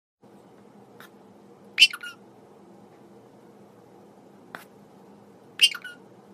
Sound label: Peek a boo parrot